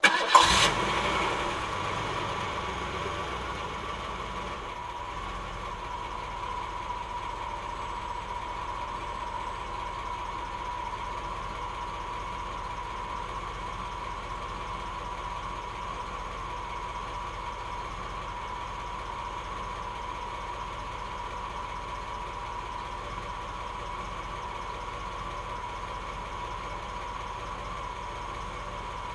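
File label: Cadillac 90 Start Idle; Cadillac 1990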